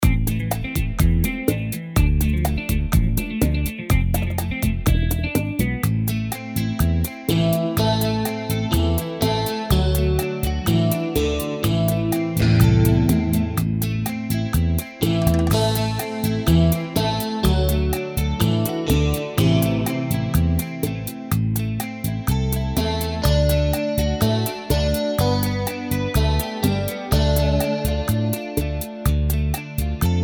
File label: Bachata; AiR Symphony